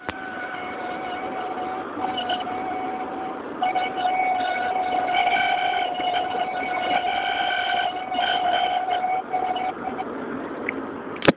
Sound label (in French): bruit, Renault Clio II